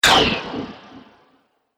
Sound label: Science Fiction Laser Magnum Laser Shot, laser gun